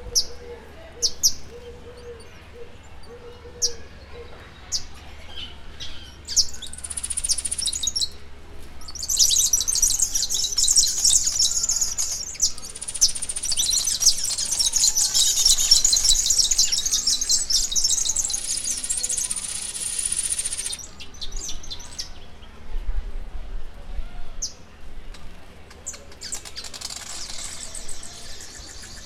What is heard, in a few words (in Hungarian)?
lisztmajmocska; szegedi zoo 2011